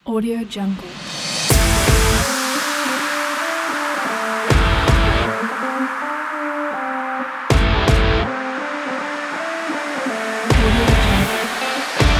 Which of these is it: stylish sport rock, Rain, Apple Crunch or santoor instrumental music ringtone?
stylish sport rock